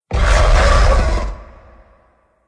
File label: attack boss; croods